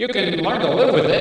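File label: echo crossfade, transwaves; Soundengine - CSR-000 The Art of Transwaves; MOVING PART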